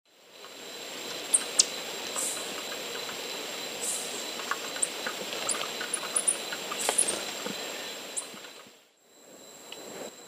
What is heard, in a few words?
Tangara xanthogastra, calls and flight calls; S. America; Eastern Lowlands